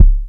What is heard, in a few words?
raw steel kick drum sound c sharp key